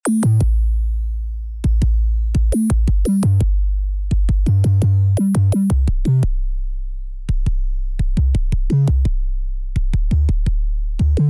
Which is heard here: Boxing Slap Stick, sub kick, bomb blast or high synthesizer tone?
sub kick